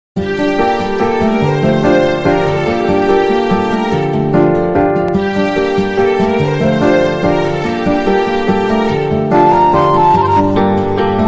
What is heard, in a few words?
villain beats ringtone; moonu x vip